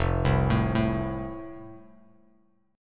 F Major, Bass Standard; arpeggiated

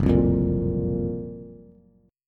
Gb Major 7th Flat 5th Bass; GbM7b5 strummed; JGuitar

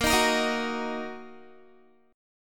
Bb Suspended 4th Sharp 5th Bb down Guitar; strummed; tuning 4E,4A,5D,5G,5C,6F

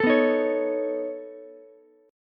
B 9th B down Ukulele C6; B9 strummed; B9 Chord; JGuitar

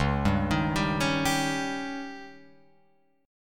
Db Suspended 2nd Flat 5th, Guitar, Open F; 1,2,3,2,4,2; arpeggiated

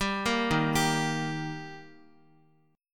Eb Major Eb down Guitar 4E,4A,5D,5G,5C,6F; arpeggiated; JGuitar

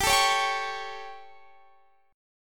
G Suspended 2nd Flat 5th G Guitar Standard; Gsus2b5 strummed; JGuitar

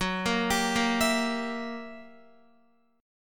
Gb 7th Flat 9th Gb down Guitar; Gb7b9 arpeggiated; 4F,4A,5C,5G,5C,6E

